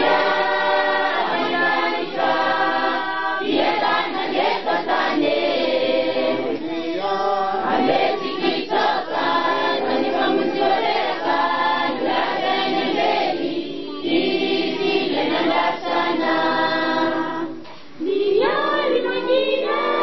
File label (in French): chant messe